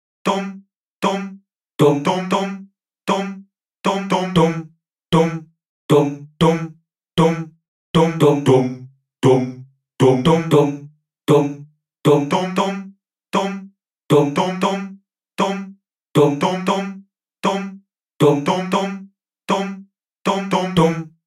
Bass line

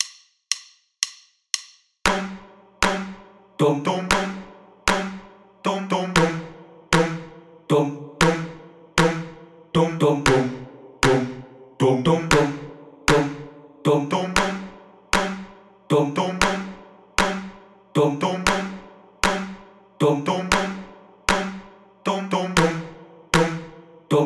Bass clap on 1 and of 2

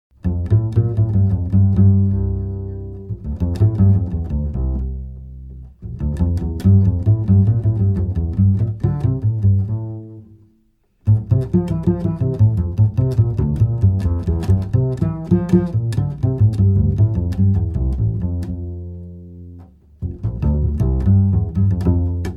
double bass intro

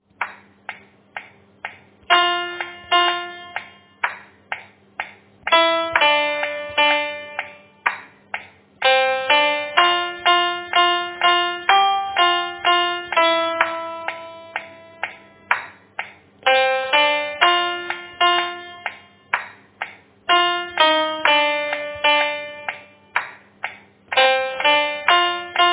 voice

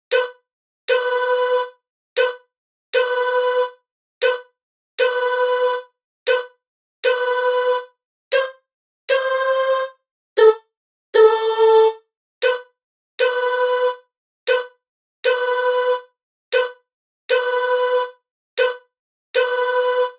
Voice intro

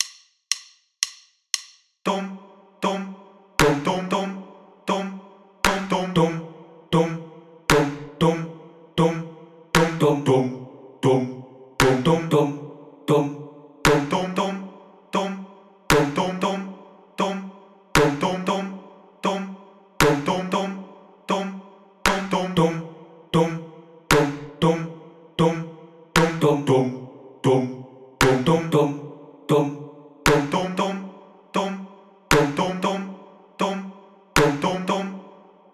Bass clap on 4

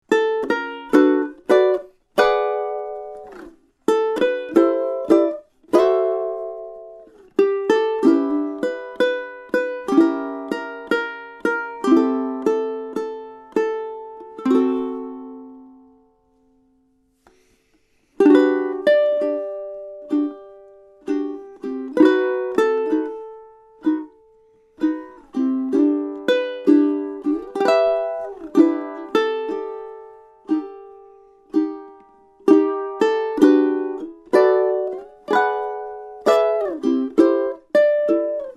ukulele intro

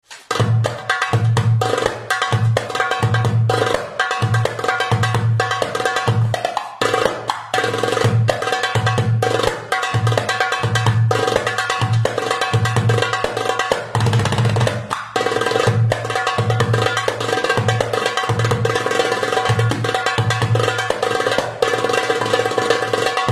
darbouka intro

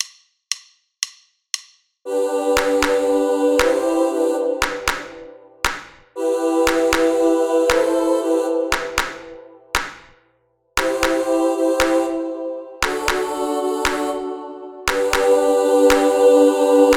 CHORUS no bass

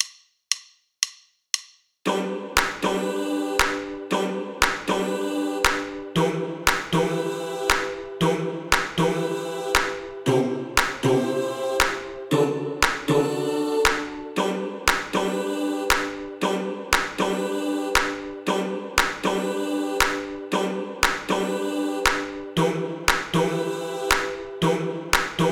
INTRO clap

